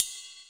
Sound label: Ride; Equipped Music; Loungin House